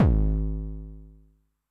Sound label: BD MOD Overdrive Med, bass drum, 909; classic machines; 909mod